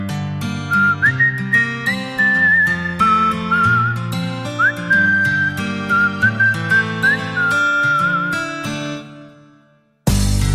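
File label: beautiful whistle; krasivij svist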